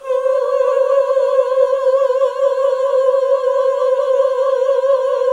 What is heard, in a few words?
Gosp. Oooh; Sound & Vision - Gigapack II CD 1 (Roland); HAL Gosp. Oooh 1